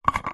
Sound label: household lightbulb single screw turn plastic lamp